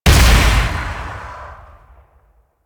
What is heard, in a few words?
Kuva Hek Secondary Shot, weapons; Warframe